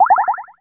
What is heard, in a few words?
menu